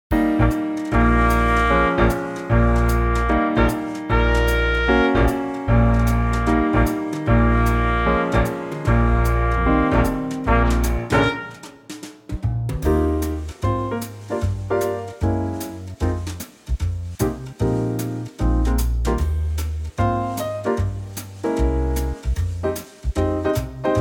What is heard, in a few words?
Eb quartet swing